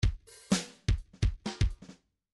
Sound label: midi grooves funk